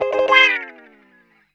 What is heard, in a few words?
GUITAR